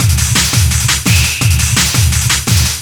LOOP, Eurotech; Sounds Good

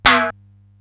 SDS9 RM, Simmons SDS-9, Rimshots

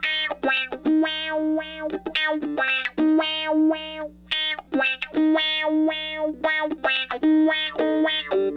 Raw Funk Guitar

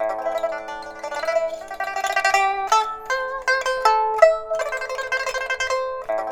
PIPA, 90; INIS - Opium

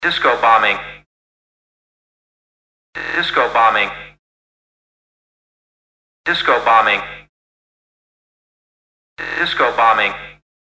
Disco Bombing, Voice FX

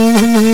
DIGDOO, FAIRLIGHT CMI IIX WOODWIND

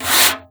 Conga, Session Instruments; Best Service ProSamples vol.42

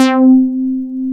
MOOG, Source Bass

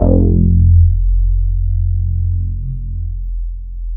bass, synths

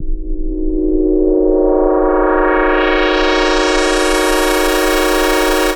Chaos Pad; Sonic Foundry (Sony Creative Software)

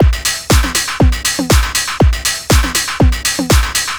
4 floor beat, trance

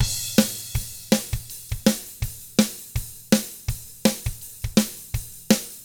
ROCK, Drums; ILIO; Double Platinum Drums 1; 164 ROCK

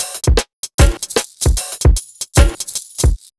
BT, Ragga & Dub Reggae; USB Soundscan vol.27; AKAI

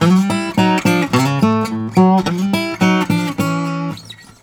Blues Guitar; ILIO; Fretworks

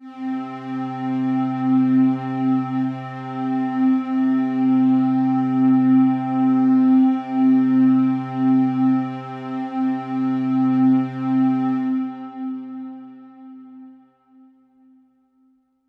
Pad C, Synth Prophet One Shots; Electronisounds

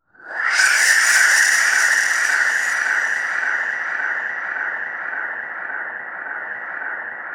SPIT SWEEP, NOISE, guitar; Spectrasonics; Bizarre Guitar

